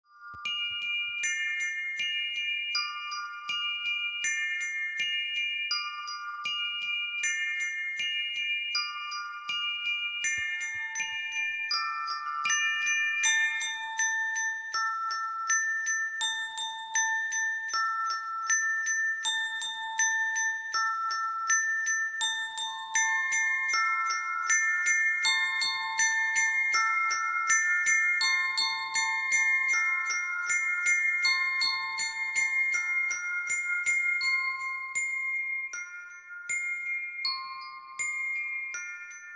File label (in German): Das Wassertropfen Mantra